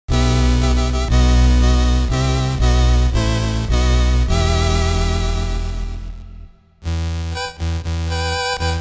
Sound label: music ballroom intro; Skellboy